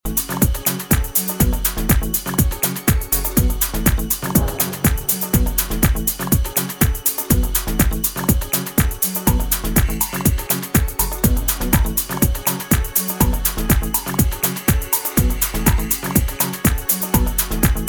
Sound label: Analog Inst